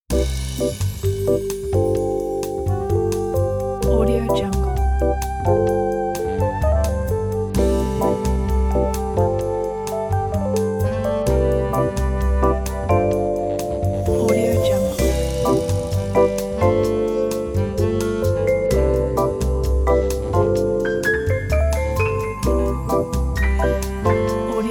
happy muzak

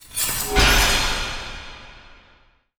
magic lock open broke fractured; magspel

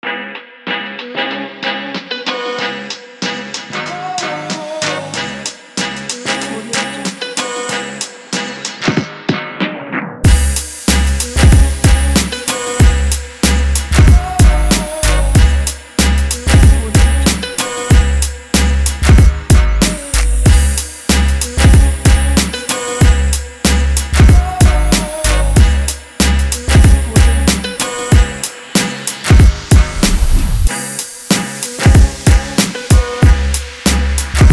hiphop

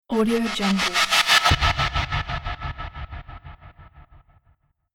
cinematic shift

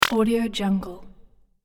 hit blip collect

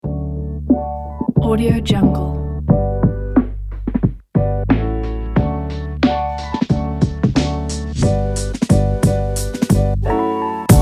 lofi hip hop beats pack

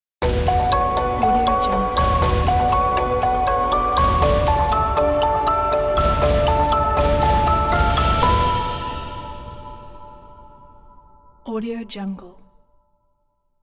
suspense gothic stinger

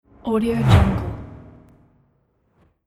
fast swoosh

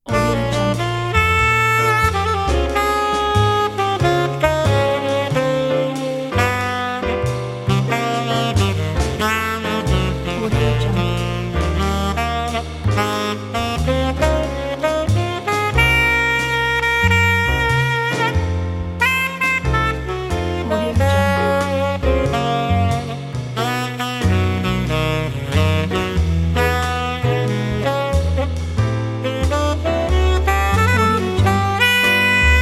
coffee jazz

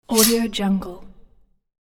sword slide; alexm76

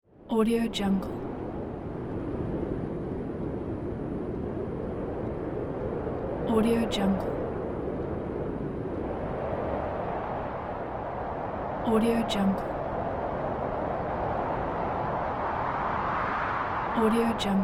wind

